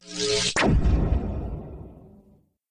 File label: teleport arrow; server-nalc